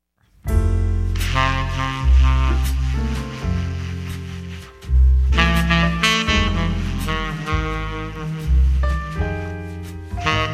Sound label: Smooth Jazz